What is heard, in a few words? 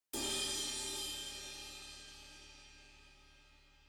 ride edge